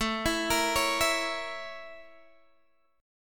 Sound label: A sharp Minor Major 7th Flat 5th A